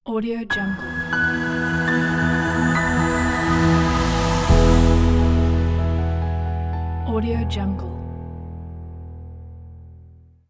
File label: elegant opener logo